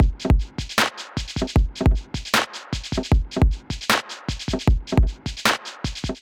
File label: Beat 154 bpm; MIDI Clips; Skitter and Step; Drifting Back